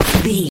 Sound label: footstep grass land